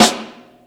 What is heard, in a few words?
Brown Snare, Snare One-Shots; KJ Sawka; Drum n Bass & Beyond; Drum Tool Box